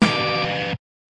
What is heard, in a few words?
Hectic Snare, DrumKit; REAL LIVE KITS; Osama Qureshi DrumKit